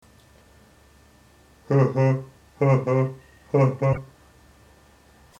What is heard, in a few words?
hahahahahaha